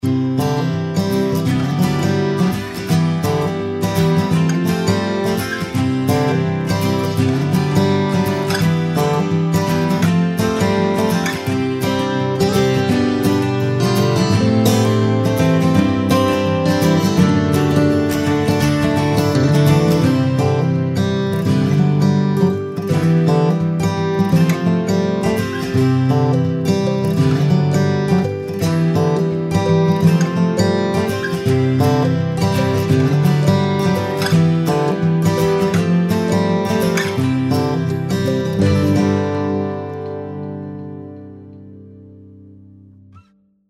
AMERICAN FOLK BALLAD

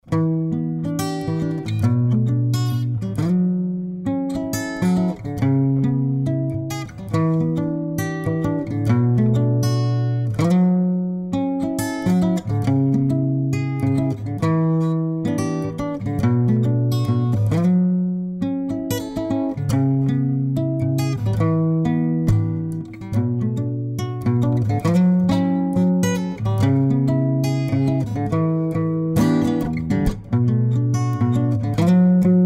CHILL POP

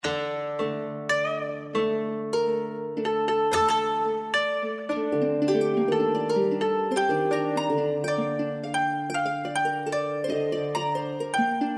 MOHARP AND KOTO

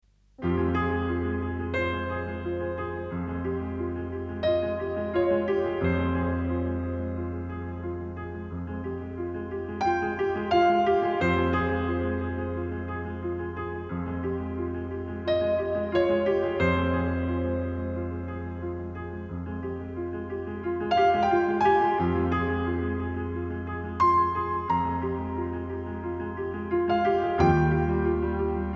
PIANO

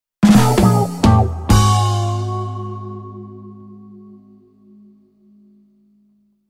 JINGLE GUITAR

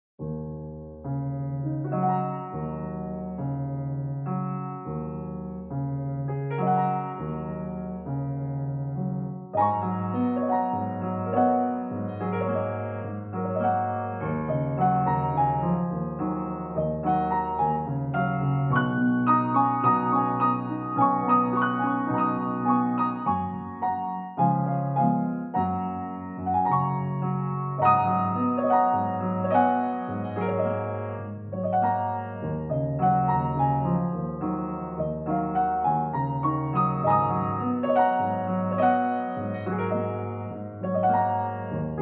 EMOTIONAL EASTERN PIANO

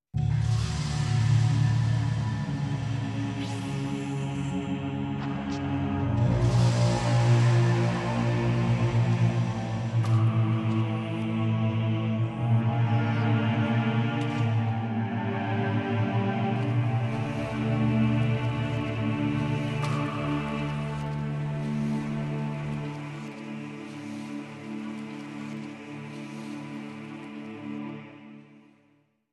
AMBIENCE SPEAKER

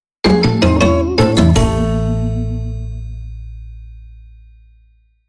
JINGLE MARIMBA

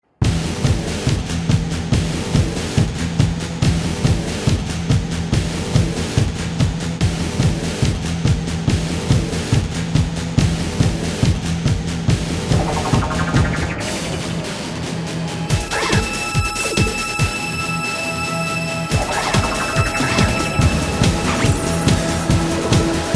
GLISSANDO

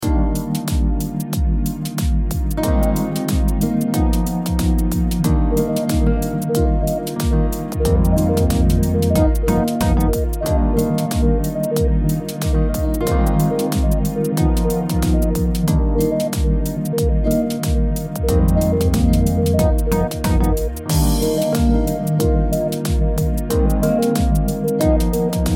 BALLAD ONLY

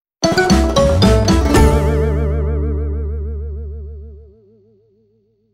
JINGLE MARIMBA

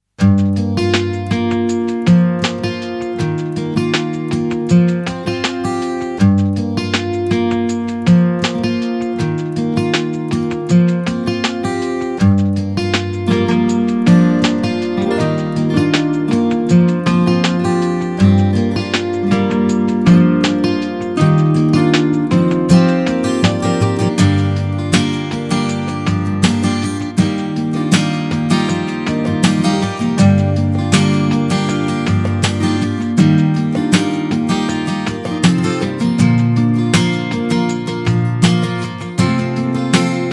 DEEP WAVES

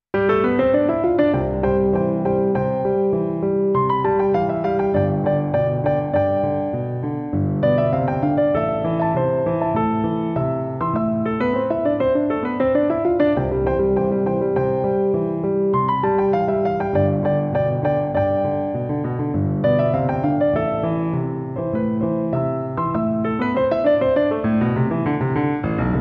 PIANO

